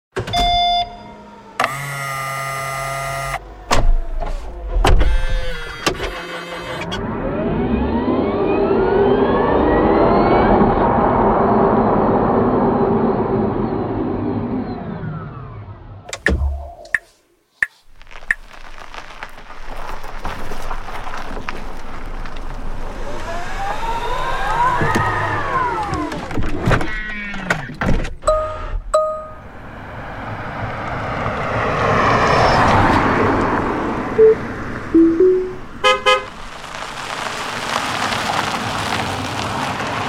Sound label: Tesla Electric Car Electric Car Sound